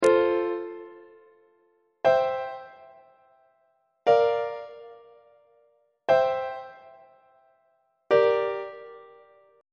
e sharp major deceptive cadence on treble clef

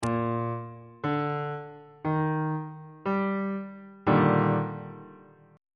d sharp major 7th 2nd inversion on bass clef

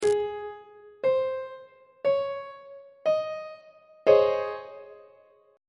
d flat major 7th suspended 2nd 2nd inversion; on treble clef

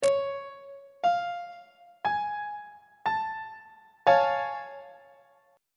b double flat augmented major 7th 1st inversion; on treble clef

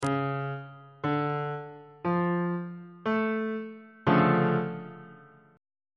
d minor major 7th 3rd inversion on bass clef

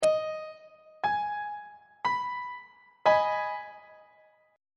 a flat minor 2nd inversion; on treble clef

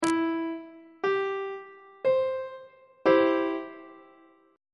d double flat major 1st inversion on treble clef

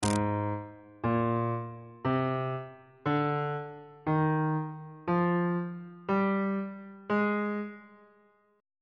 g sharp lydian mode note positions on bass clef

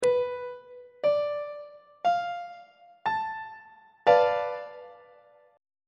d minor 6th 3rd inversion on treble clef

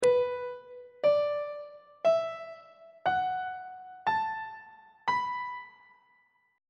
b minor pentatonic scale on treble clef

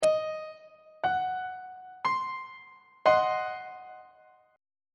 b sharp diminished 1st inversion; on treble clef

